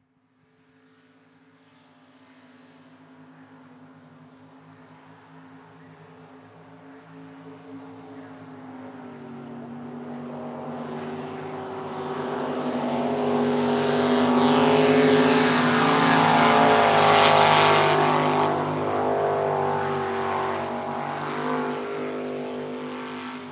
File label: Swamp Buggy Pass By Fast; Boats (Sound Effects:Vehicles)